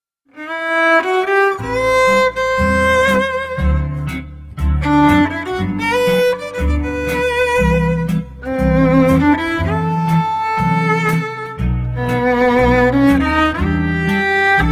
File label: violin jazz slow swing